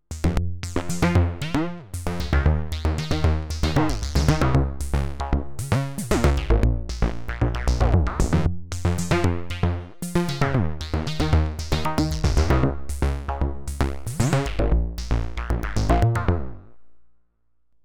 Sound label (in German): Model15 Sequenzen